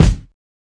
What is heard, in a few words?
lofi kick; Kicks; single hits